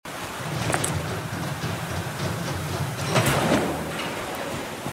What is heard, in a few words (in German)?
durchsuchen auto; The Flame in the Flood